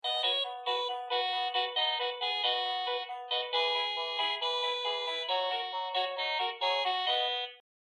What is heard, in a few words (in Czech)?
melodie